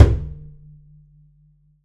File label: perc, pizz; meatbass